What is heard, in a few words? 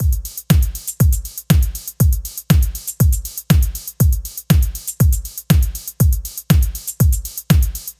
musical compressed house or trance drum loop